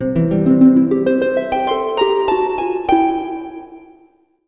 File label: SUCCES, JINGLES; MOBICLIC41